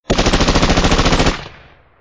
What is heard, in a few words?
Machine Gun Sms